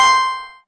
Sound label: level up